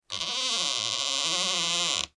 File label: Horror Door Squeak Creak Short; Creepy Door Sound Effects; Creepy Doors (Sound Effects:Horror)